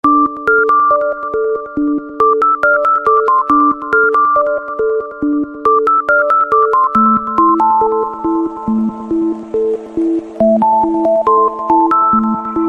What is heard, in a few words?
Robots Outro Ringtone